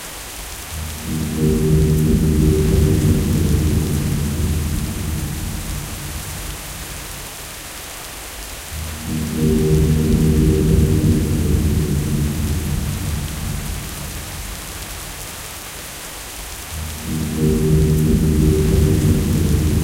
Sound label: Music Loop